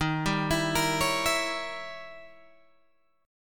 D sharp 7th Flat 9th, D sharp, down, Guitar, Open G